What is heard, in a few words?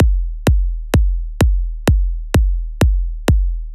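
Drum Loop 128BPM bass drum G; VEC5 Drumloops; VEC5 Multi Loops; Loop Elements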